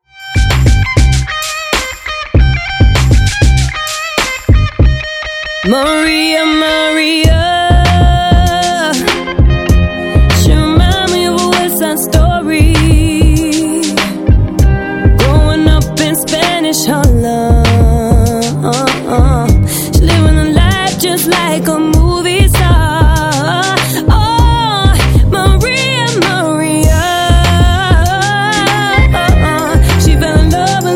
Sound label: duo electric